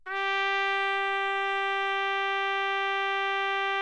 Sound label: TRUMPET, SECTION LOOP; Best Service; Killer Horns